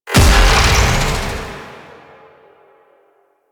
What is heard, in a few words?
Melee Charge Explosion; Warframe; Necramech